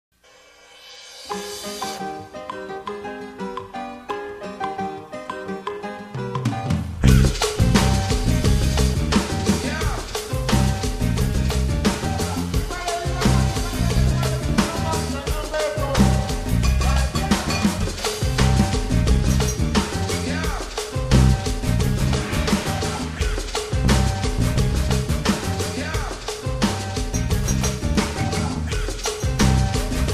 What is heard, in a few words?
Latino Lounge